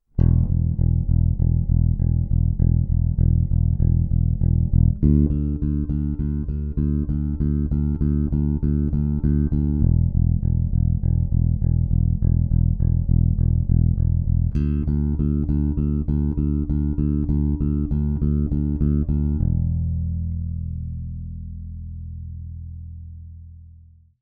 With Muting Technique, Bass; 7-Day-Bass-Challenge

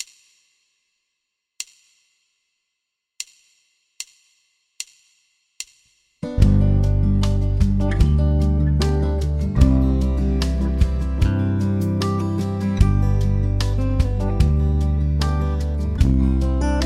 Roots and 5ths Full Band 75BPM; Root 5 Bass Lines; Bass Road

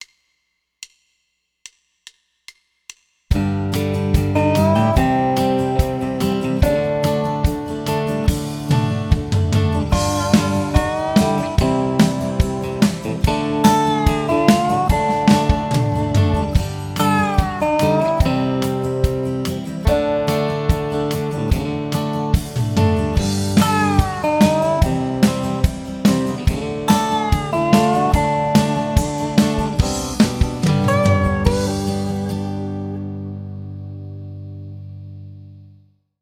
Country Road Slide Backing Track 145BPM; 7-Day-Bass-Challenge

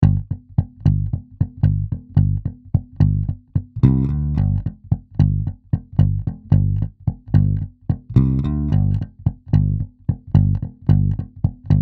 Ghost Notes Bass Only

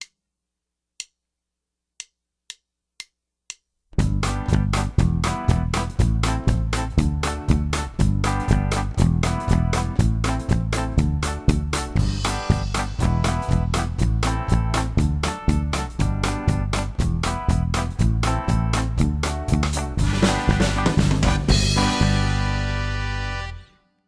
Ska Bass; Beginner Ska Bass Lines Writing Formula

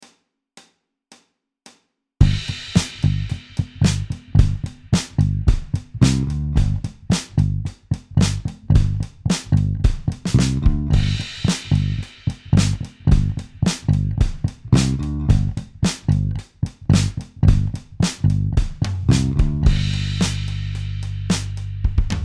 Ghost Notes Example

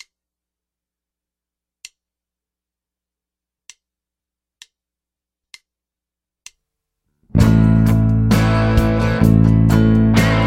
1 Finger Song Playthrough 65BPM, Bass; Bass Road; Bass Guitar 101

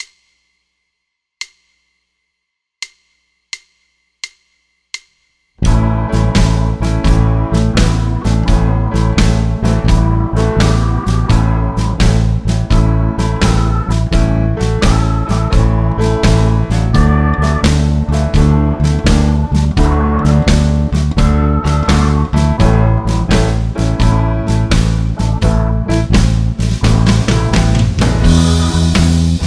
Bass House Blues Full Band; Shuffle Rhythm; 7-Day-Bass-Challenge

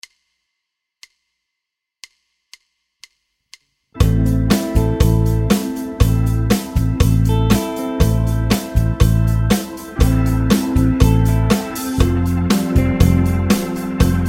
Ballad Rhythm Playthrough 120BPM, Bass; Bass Road; Bass Guitar 101

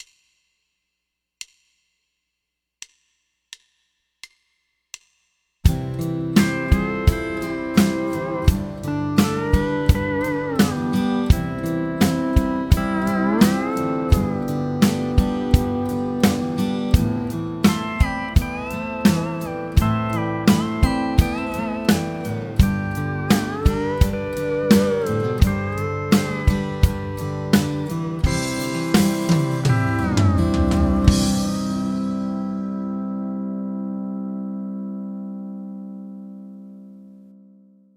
Backing Track 85BPM; Ghost Notes; 7-Day-Bass-Challenge

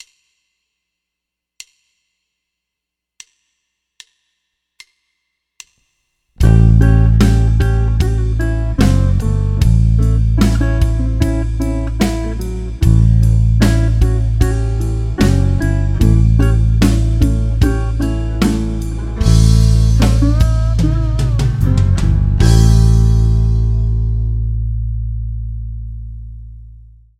Lick, Simple Blues Bass Lick; Bass Road

